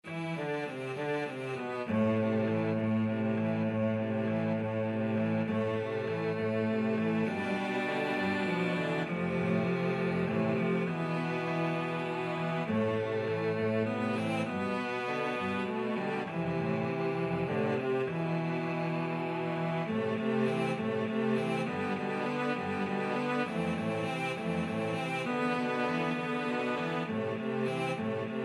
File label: spanish violin, cello ensemble